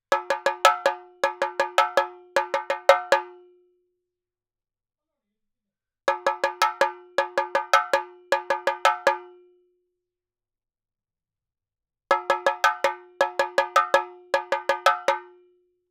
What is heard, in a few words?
CHENDA 80 fills; CHENDA 4 4; Sample Banks; Percussion; CHENDA TRACKS